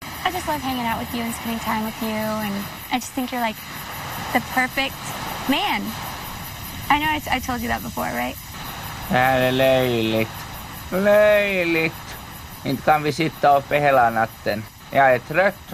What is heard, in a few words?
sniff; spinoff